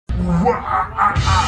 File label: wahahahah